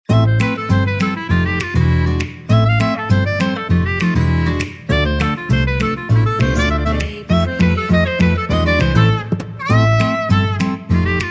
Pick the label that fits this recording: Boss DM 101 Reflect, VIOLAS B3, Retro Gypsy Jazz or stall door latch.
Retro Gypsy Jazz